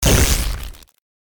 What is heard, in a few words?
impact; cstrike; war3source; manashield